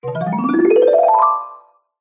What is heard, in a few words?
cartoon ascend mallet orchestral; zapsplat